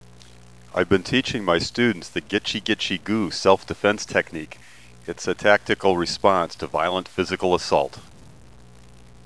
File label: tickle